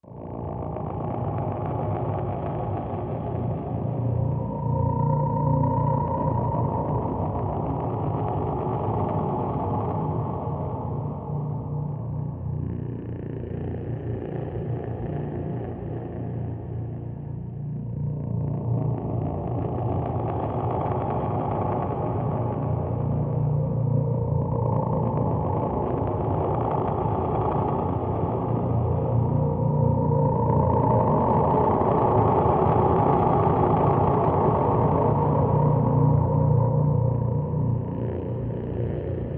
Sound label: Dissonant Air Distorte, sci-fi ambience